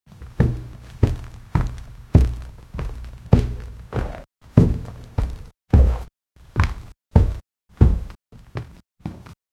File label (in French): bruit de pas, far